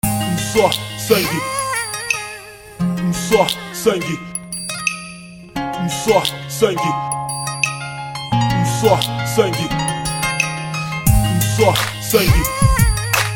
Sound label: beat